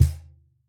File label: Cajon hit mp